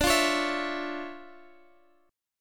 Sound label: D Minor Major 9th D down; DmM9; strummed; JGuitar